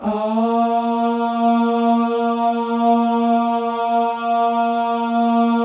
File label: MALE AHS, Symphony of Voices; Spectrasonics